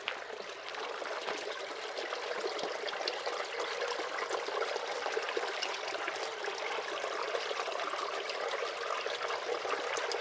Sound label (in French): Canalisation